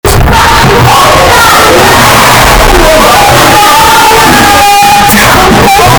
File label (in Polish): krzeslo clang